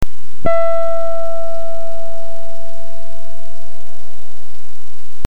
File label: guitar E5